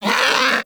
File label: Predator vocal pain; Warframe; Hunting Critters; Characters